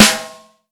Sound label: verby acoustic snare sample g sharp key, steel snare drum sample